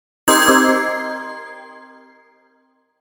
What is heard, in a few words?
Bell Multiple Short Reverb; Production Element Imaging Element Accent Transition; 5.1 STEREO MIX; Blastwave